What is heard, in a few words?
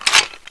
thompson clip out